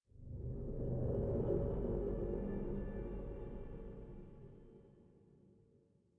sting metal groan; Rust

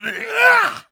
vce spin

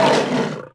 Lion Hurt Vox; Civ4 warlords

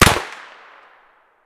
gyrza far; Escape from Tarkov

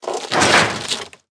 metal fence; World of Tanks Blitz

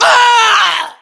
death

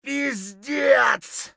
hurt neardeath; Escape from Tarkov

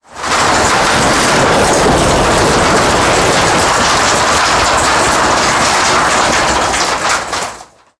turn wheel, tanks; War Thunder

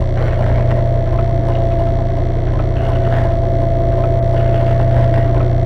medium generator loop

